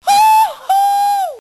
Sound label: Voice Wohoou; Michael Jackson's Moonwalker; Arcade